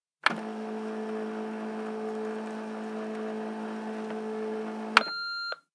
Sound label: machine office, ambient; nuke